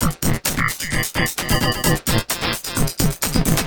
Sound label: Beat, Industrial